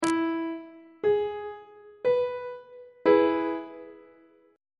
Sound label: d double sharp major chord on treble clef